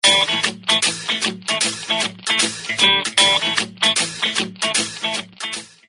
Samsung Galaxy Xcover 3 Default Sms

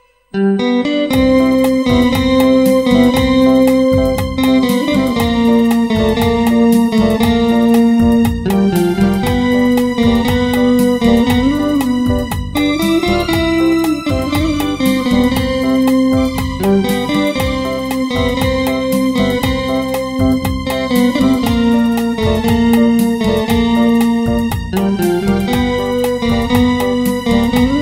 Malayalam Ceremony Music

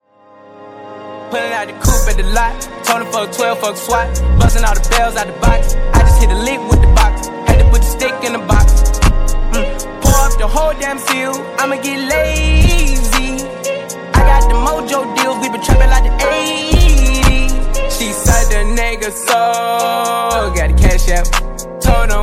Rap Ringtone English

